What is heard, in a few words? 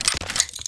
ammo pickup; Items